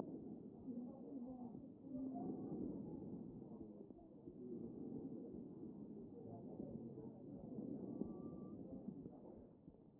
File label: chest